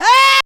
Rev Yeah, dry perc; Quasimidi Rave-o-lution 309

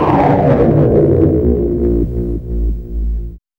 SYN-FX; X-Static Goldmine 1

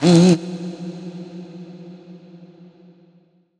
Fx Vox; Equipped Music; Loungin House

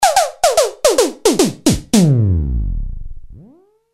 Loop Snr, Drums; leloops

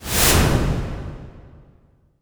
IMP WIPE, Cinematic Impact

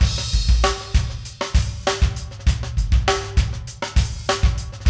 funky drums; AMG; Now CD-ROM (Roland); NOW from HELL!

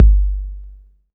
BD, Dance Drums

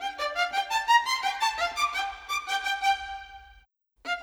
Violin; Sonic Foundry (Sony Creative Software); Orchestral VOL-2; Modern 09

